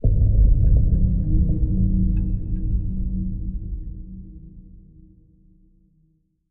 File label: intro continue; rise of maya